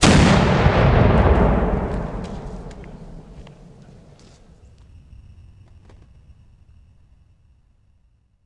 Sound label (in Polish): wystrzal wybuch